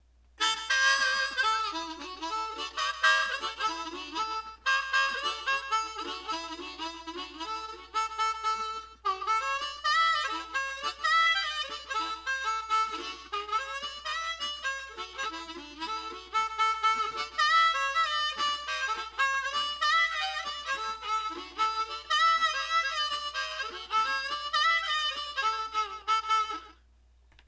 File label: harp jam